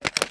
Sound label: plr sm beta reload fast mag in; gmod